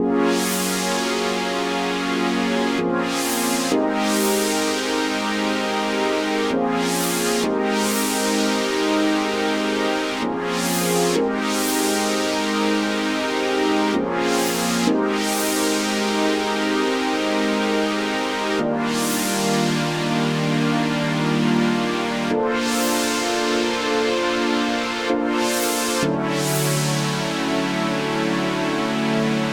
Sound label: pads; 129BPM; Pony Fan Music Remix Stems